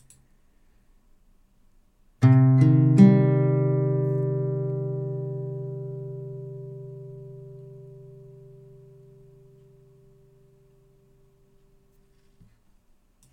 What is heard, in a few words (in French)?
accord de DO mineur, guitare